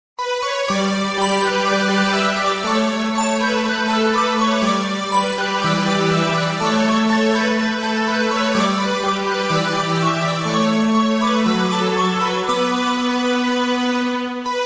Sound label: instrumental; ymnoi